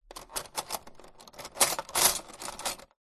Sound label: cutlery touch light in kitchen drawer; household; zapsplat